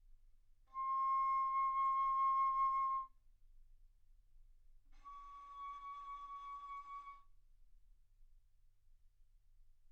Bass Flute ff C6 Db6

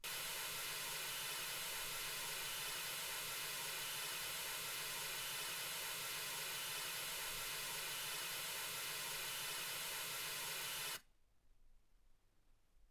mls 48kHz

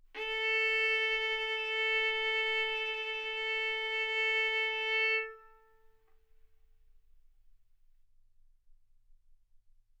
Violin arco A4 B4; mf; sul A; stereo

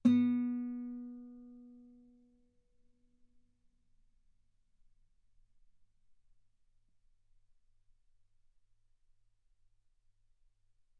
Guitar ff sul B B3

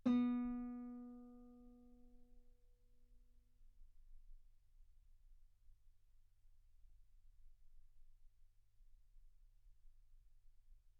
Guitar B3; ff

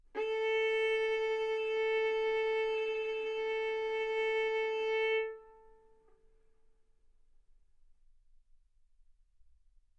Violin arco mf sul A A4 B4 stereo

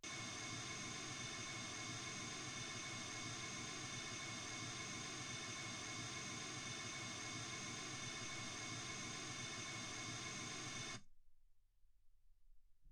mls 48kHz

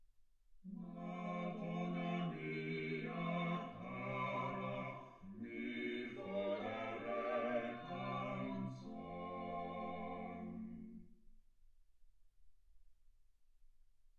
quartet

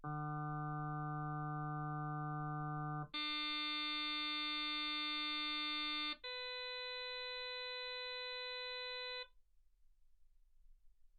harm sinus 48kHz

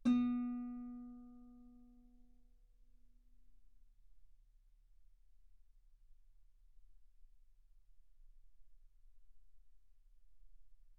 Guitar ff B3; sul B

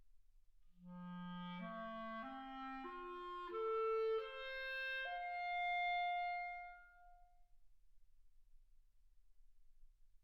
clarinet

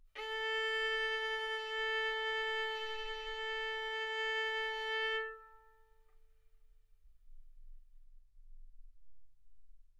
Violin arco A4 B4; mf; sul A; stereo

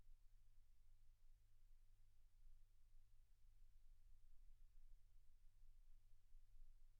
silence 48kHz

